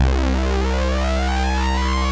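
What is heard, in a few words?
ANALOG PAD; SONIC ELEMNT; SONIC EL YES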